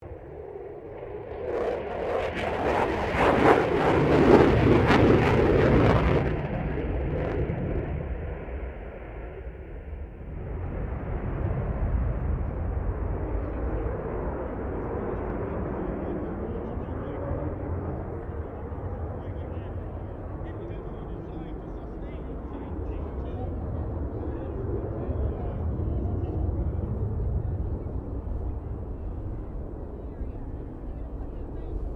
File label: F16 Falcon pass distant, stereo; Airplanes; Vehicles